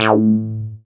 SWEEP BASS; Masterbits; Dance Trax

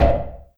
Oneshot

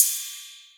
Cymbal, Novation Drumstation; DrumstationV1

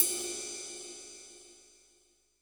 RIDE; JV-1080 DRMS; EdgeSounds; Drum Mashines VOL-1

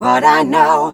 f mel e flat, fem Vocals

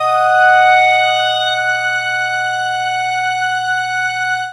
Ambient FX, Ambience FX

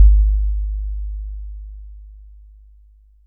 KICK, Hip Hop; PlatinumPhattCD1; E-MU Producer Series Vol. 8 – Platinum Phatt

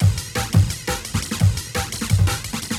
Fire FX, Loops, breaks; M8

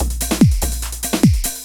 LOOP, Eurotech; Sounds Good

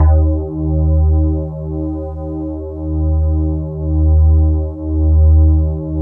E-HARP, DX HARP; Wizoo - Powered DX

